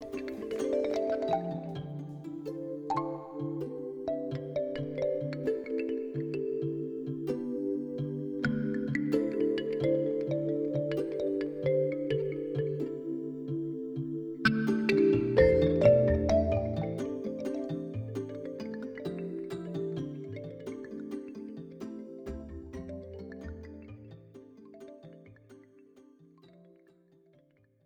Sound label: Kalimba Interlude